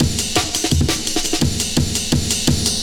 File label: Bulldozer Amen, 2A, Jungle Breaks; Blu Mar Ten - Jungle Jungle - 1989 to 1999 Samplepack